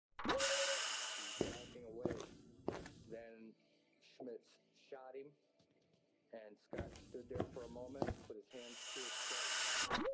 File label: door open man; Pitch Black - A Detective's Demise